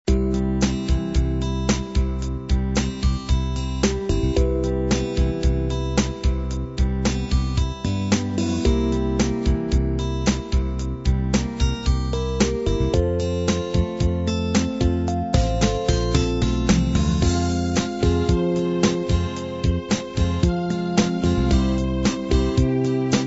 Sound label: standard 8 beat